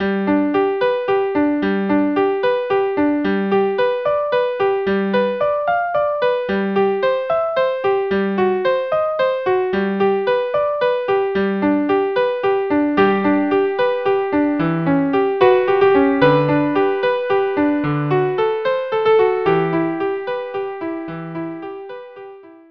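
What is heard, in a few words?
violin viola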